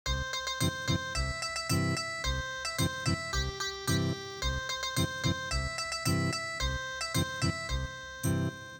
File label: Ethnic Guitar